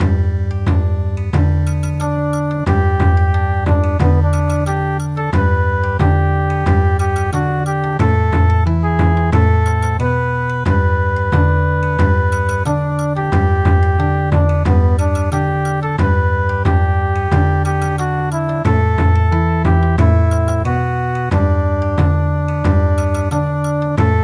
Bon Odori

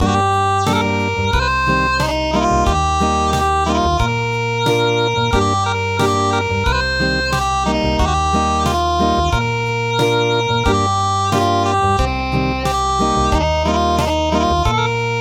Acoustic; Thai lullaby

